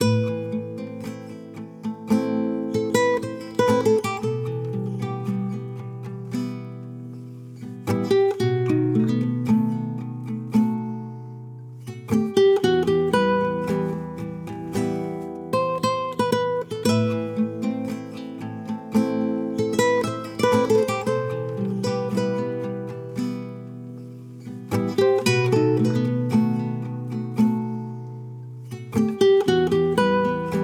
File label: 114 BPM E Min, Guitar Loops; Cymatics; Journey Guitar Loops